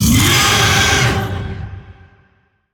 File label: Vocal Attack Short; Warframe; Zariman; Void Angel